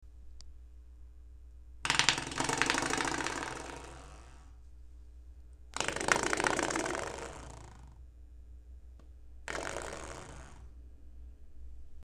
doorstop

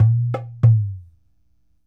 Dumbek

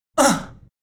male grunt with; Grunts; Butterfinger Video Sound Effects

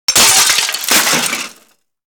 glass med double; Asian Show Sound Effects

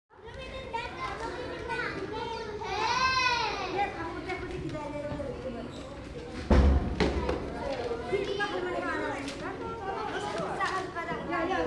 elementary kids in; Asian Show Sound Effects

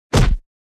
kick body hit; fights bodyfalls; Asian Show Sound Effects

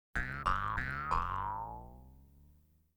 electronic twangs; music electronic; Asian Show Sound Effects

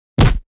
punch body hit; fights bodyfalls; Asian Show Sound Effects

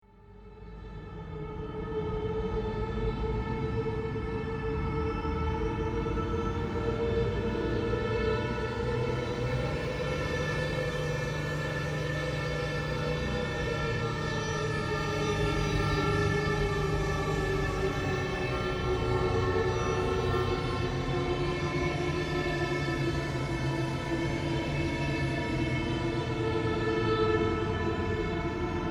Suspense

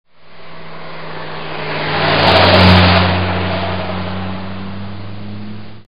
truck drive by

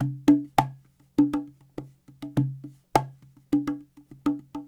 Cahon

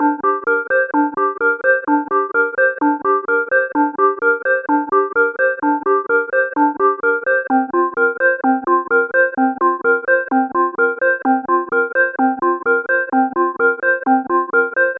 Flute Arp

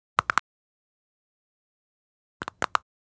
cracks knuckle; fights bodyfalls; Asian Show Sound Effects

